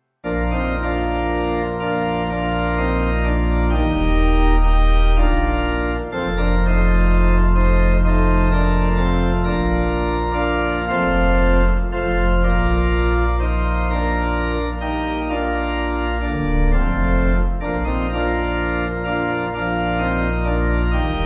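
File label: Pipe, Pipe Organ backing; Retreat 8.8.8.8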